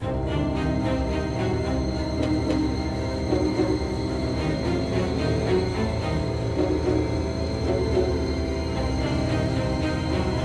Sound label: Alert; Sarco Stealth Layer; tintin reporter cigars of the pharaoh